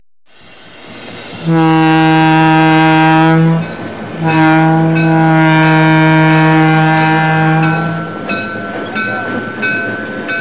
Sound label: up horn bells